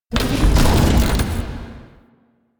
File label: UI Mod Activate Pilot Weapon; Player Ship; Warframe; Railjack